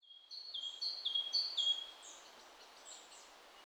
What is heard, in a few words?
birds outside wide, European Ambiances; 3maze